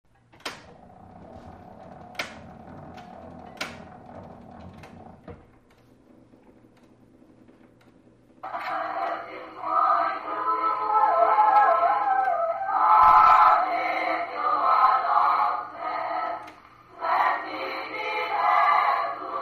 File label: Victrola Click On Run, record players